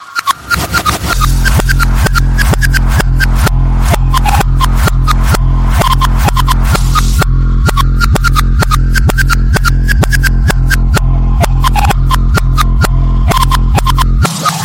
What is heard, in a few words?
Popcorn